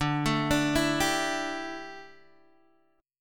D Suspended 2nd Suspended 4th D down Guitar DADGAD